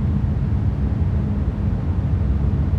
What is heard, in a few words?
interior; ambient; Counter Strike Model Pack; CS:GO; survival